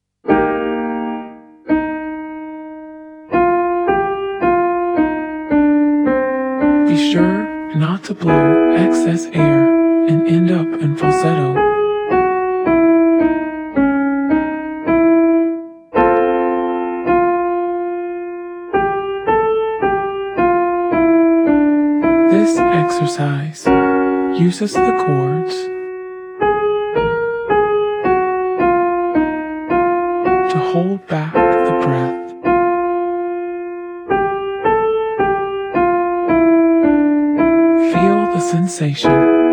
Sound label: Exercise Your Turn Male down 4